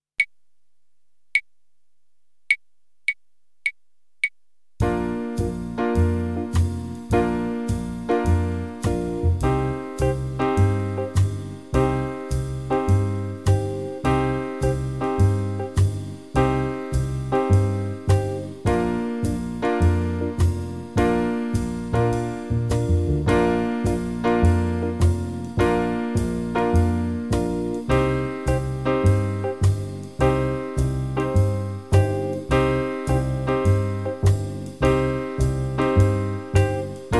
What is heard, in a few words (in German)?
Akkordschema